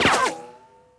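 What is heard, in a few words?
ricochet; tusken; Star Wars Obi Wan; XBOX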